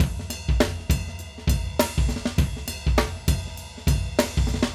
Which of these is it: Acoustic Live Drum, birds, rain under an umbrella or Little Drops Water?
Acoustic Live Drum